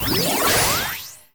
Enemy Box common Charge

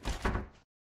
Swing Cupboard Open; Lethal Company

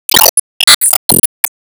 Warcry

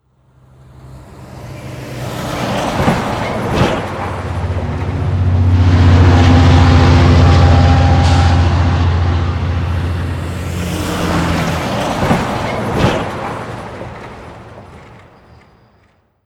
Hooverdam Trucks; Hooverdam1 Trucks

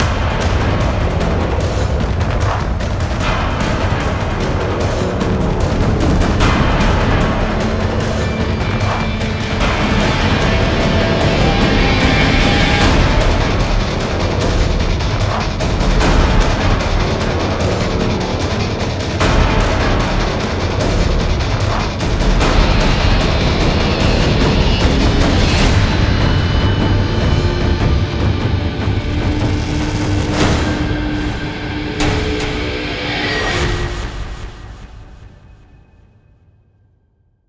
Base Loop low; extinction deluxe edition; ps4 windows xbox one

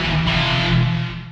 Electric Guitar Soft; Heartslash

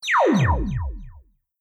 Ingame Penalty Damage; Se Ingame Penalty Damage

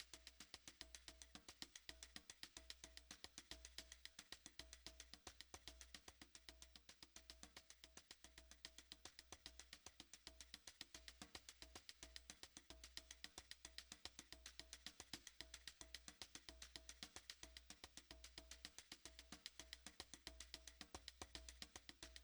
Music Terrain Flying; Music Terrain Flying1x

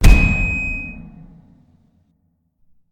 mic Throw; Friday Night Funkin' - Vs. Ross

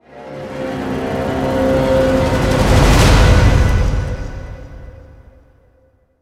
Stinger; extinction deluxe edition; ps4 windows xbox one

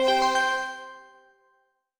GAME START; D4DJ Groovy Mix; Android, iOS, Mobile

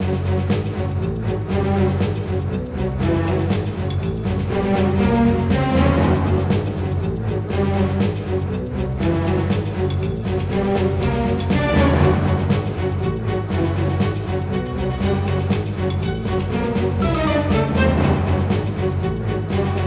Boss combat; The Hobbit; PS2

